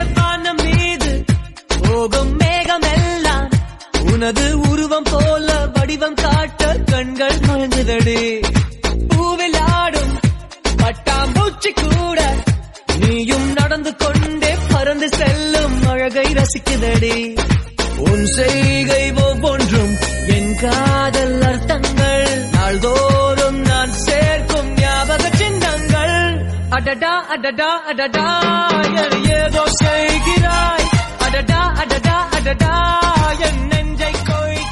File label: Tamil love song Trending Ringtone